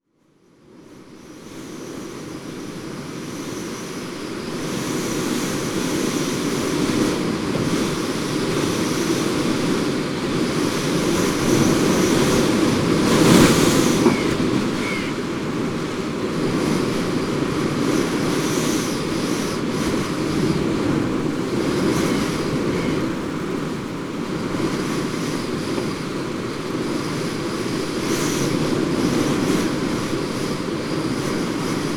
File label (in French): Mezilhac en decembre, Ambiances Sonores d'Ardeche; Studio Les Trois Becs